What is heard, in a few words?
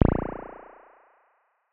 Spring sim